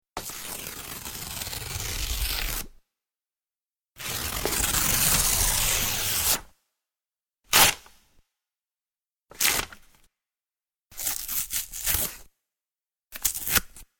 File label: Paper Rips, Various paper tears.; Materials